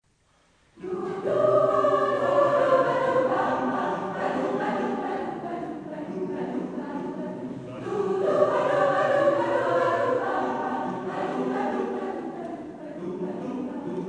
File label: Bourree